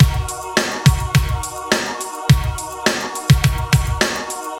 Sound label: 105 bpm 80s drum beat c key, kick tuned to the C note